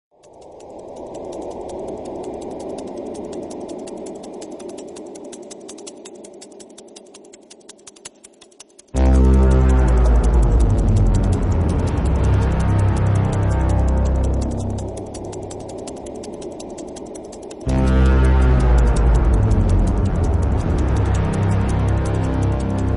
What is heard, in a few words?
Eerie Flapping, Original Sound Track; 2.0 (Original Sound Track) (2019)